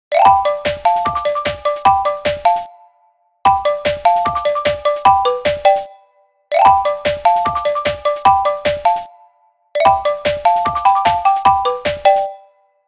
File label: Optional, alarms